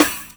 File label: Drum & Bass; Best Service ProSamples vol.03 - Drum & Bass 1; AKAI